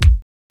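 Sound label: BASS DRUM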